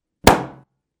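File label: bursting balloon sound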